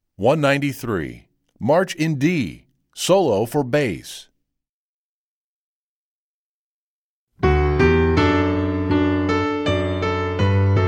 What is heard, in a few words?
March In D Solo Bass, Strings; EE2000 Book1